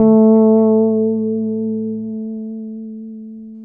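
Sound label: A 4, fretless, bass; Spectrasonics; Bass Legends CD 1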